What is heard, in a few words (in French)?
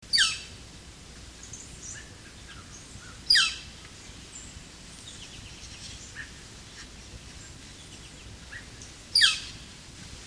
pic flamboyant, chant